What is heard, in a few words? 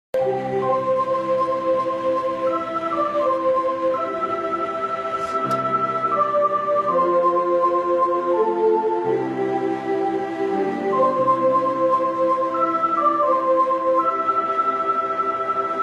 Amazing Grace on piano